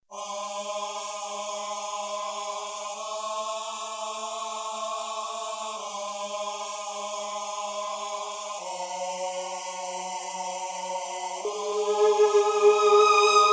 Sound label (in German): Glocken